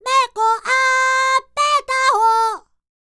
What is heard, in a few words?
Vocal Planet World Voices, NOMADIC